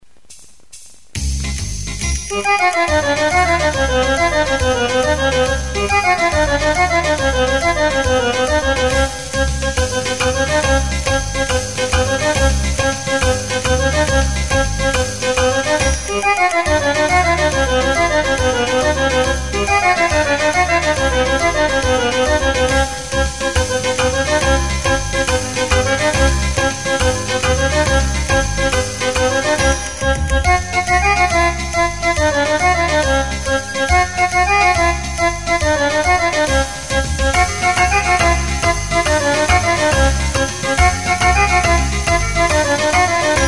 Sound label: Abruzzese Tarantella